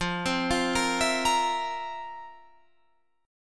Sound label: F Major 7th Suspended 4th, Guitar, Open F; arpeggiated